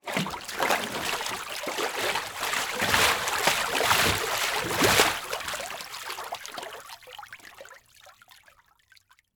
Liquid Water Water Splash Movements

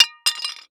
Impact Glass Fall To Ground And Not Break; Impacts

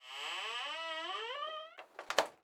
Refrigerator Close, Household

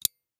Emergency Handcuffs Metal Clicking; emergency effects; AdobeCC

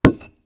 Impact Brick Drop On Sand

